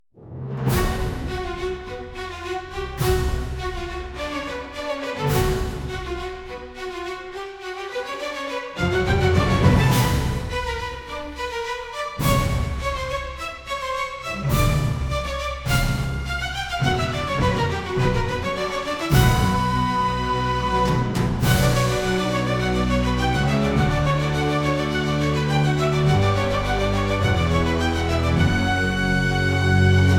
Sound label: Violin Battle